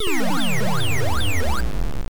Death; puyo puyo msx2 psg

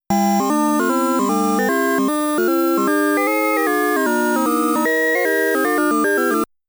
Start Music; Galaga

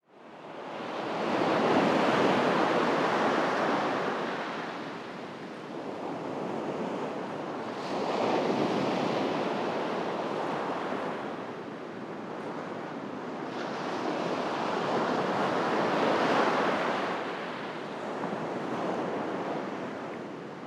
Env Sea

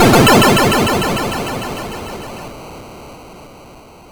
Explosion; Shinobi; Game Gear

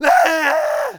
SCREAM Male mono

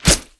Weapon Dagger Attack; Inotia 3

